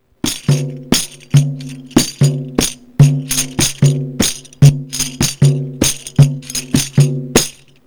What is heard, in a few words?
pandeiro sample capoeirista; brazilianmusic